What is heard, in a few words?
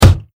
wood hvy